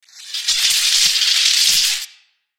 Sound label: sparking lightning; soundscrate